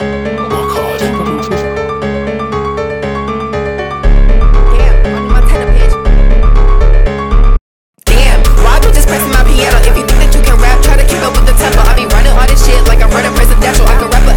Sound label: Piano Tiles